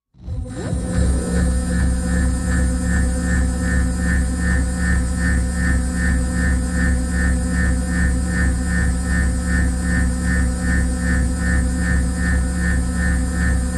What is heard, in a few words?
Driven Generator, Machine, Electrical Pulse, High Drone; power core hum